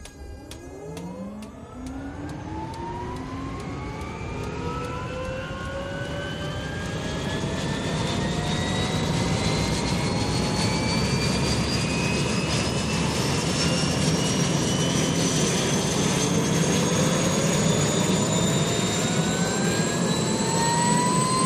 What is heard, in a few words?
UH-1 Huey: Interior Start-up, Power-up To Idle. Good Turbine Whine Definition